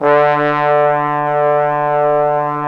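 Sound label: Bone Sec, trombone section; Roland LCDP06 Brass Sections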